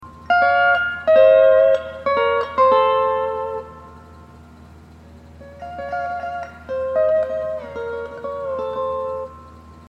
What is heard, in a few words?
hawaiian piece